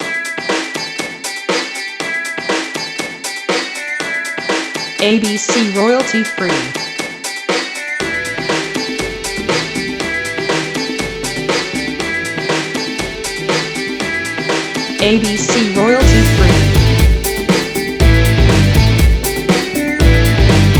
Oriental Rock

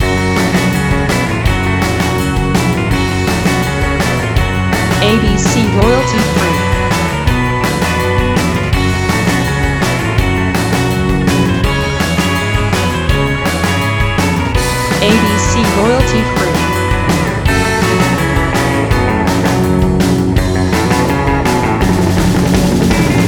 Surf Indie loop

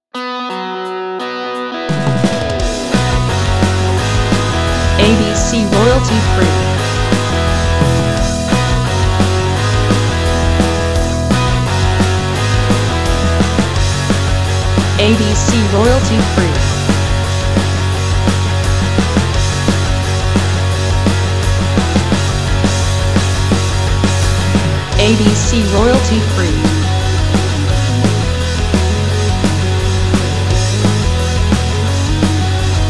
Punk

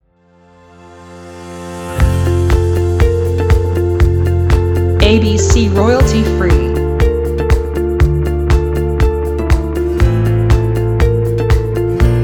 Uplifting

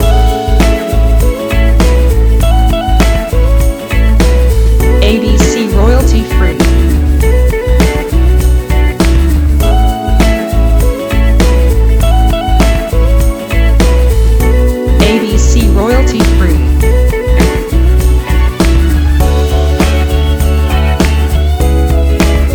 Smooth Soul

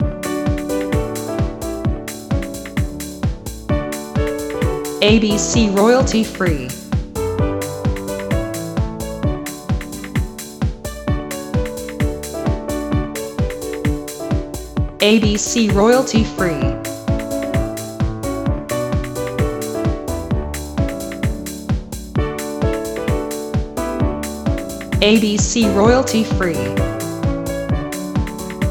Joy to the world

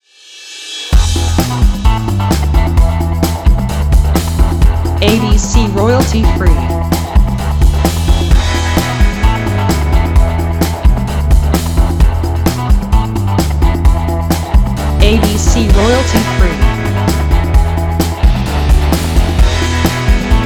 Modern Latin Indie Rock

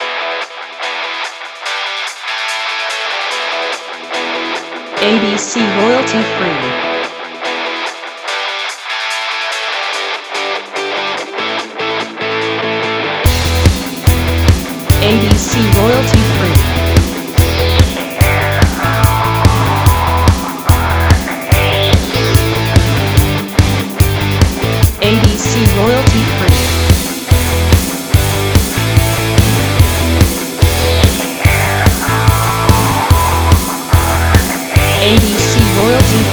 Upbeat rock

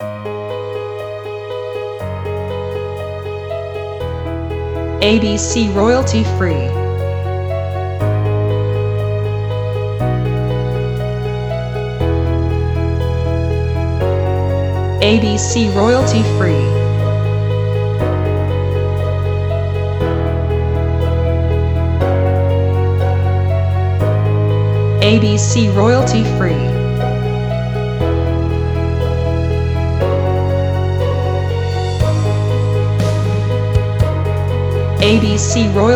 Piano Epic

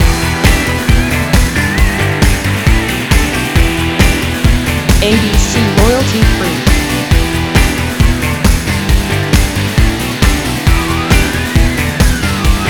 Gym Rock Loop

